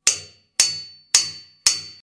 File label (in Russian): zvuk udara po metallu